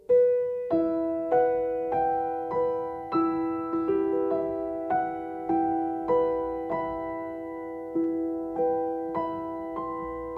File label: Toys (Without Narration)